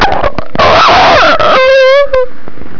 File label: DIE